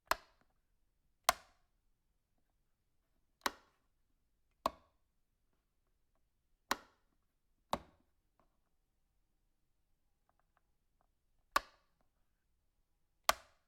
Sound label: wall light switch close